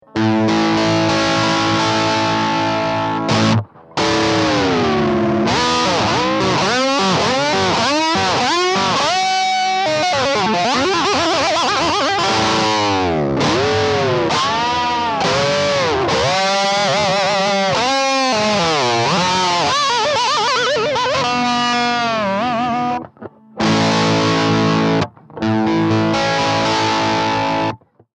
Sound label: strat arm